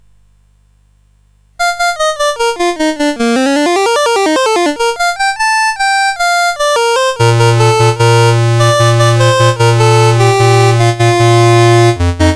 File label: CSG8580; CSG8580R5; Suicidal Software